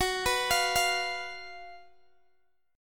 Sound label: Fsharp Major 7th Suspended 4th Fsharp, Guitar DADGAD; chordshape; down